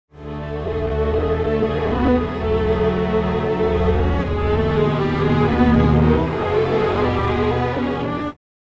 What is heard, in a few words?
strings; timestretch; ikm sampletank stretch